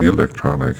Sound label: E Lectronic, Vox; Zero-G; Soundclash Nu Dance Electro; Acidized